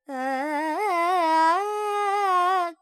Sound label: Purvi Kalyani, Vocals, Carnatic Female, Alaps, Dry; Sample Banks